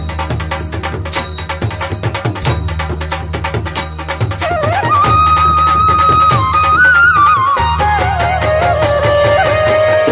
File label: indian instrumental; ringtones